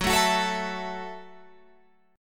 F sharp Minor 7th F sharp, Guitar Open F; F#m7 strummed

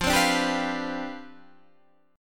C Major 7th Suspended 2nd Suspended 4th F, chord

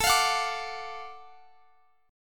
F sharp Minor Major 7th Sharp 5th A

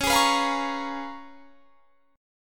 Db 13th Db; strummed

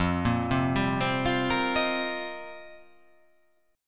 F 7th Suspended 2nd Suspended 4th

